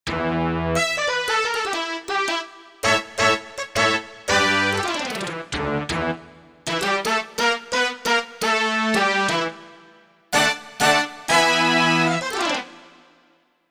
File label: Brass, Korg MSC-1S; Chin-Brass; Korg MSC-1S Variety